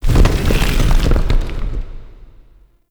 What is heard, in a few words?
Boulder Stop, traps; Objects; Champion Crypt